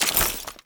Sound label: Bayonet Melee Whoosh Gear Accent; Warframe; Tenno